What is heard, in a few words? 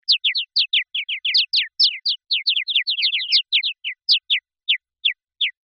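Birds Chirp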